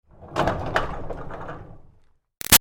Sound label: Door Barn Rolling Clang Rattle Light; Doors; Big Room Sound